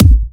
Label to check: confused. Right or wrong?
Wrong